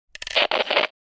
CB radio walkie talkie static burst squelch and noise